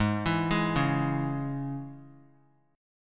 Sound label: G sharp Suspended 4th Sharp 5th G sharp; G#sus4#5; arpeggiated; JGuitar